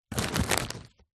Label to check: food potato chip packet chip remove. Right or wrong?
Right